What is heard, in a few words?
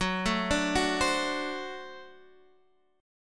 D 7th Gb, guitar